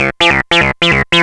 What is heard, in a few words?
ring